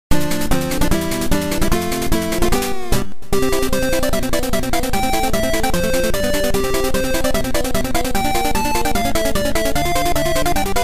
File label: ending; kirby s dream land